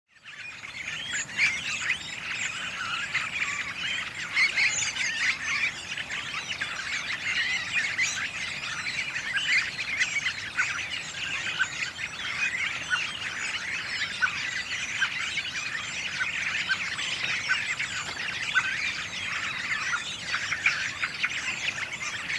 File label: Redwing